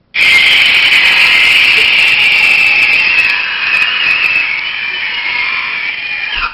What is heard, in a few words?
pterodactyl scream